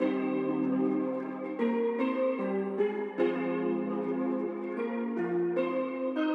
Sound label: dark piano